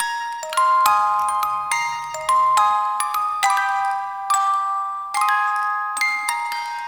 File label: Bunraku Puppet Loop Alt; Warframe; Koumei